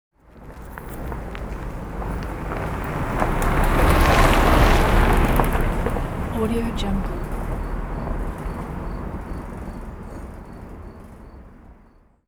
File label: car on the dirt road